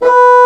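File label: Bassoon B0; Digital Sound Factory Virtuoso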